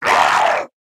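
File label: pain, zombie male; nmr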